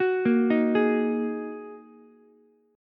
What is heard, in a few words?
A sharp 7th Suspended 4th Sharp 5th down Ukulele; arpeggiated; JGuitar